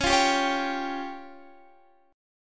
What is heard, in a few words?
Db Major Flat 5th, Guitar, Standard; strummed; JGuitar